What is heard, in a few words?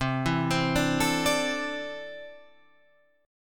C 6th Add 9th C down Guitar Standard; arpeggiated; JGuitar